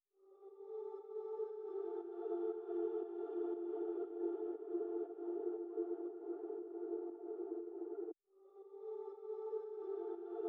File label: 118 BPM G Min Vocal Ambience; Melody Loops; Loop Stems; Cymatics; Pacha